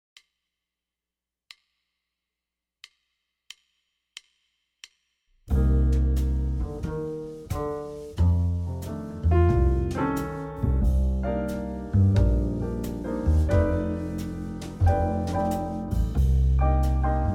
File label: Playing Melodically Practice Track, sax